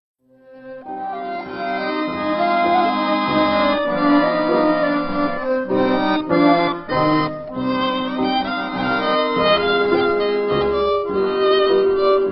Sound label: yiddish lullaby